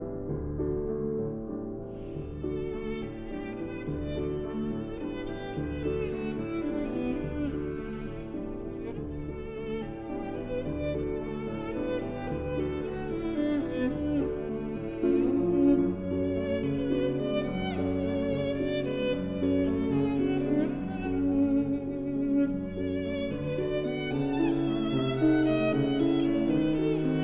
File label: Violin and Piano in D; Faure, Gabriel; Berceuse; Opus 16